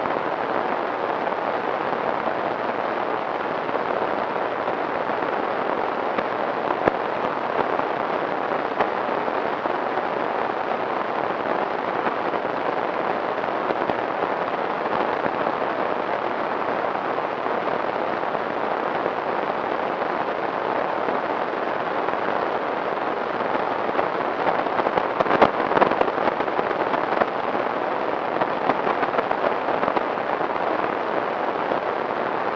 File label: 14MHz; Jupiter